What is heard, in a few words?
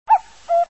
cacoo